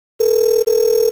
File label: call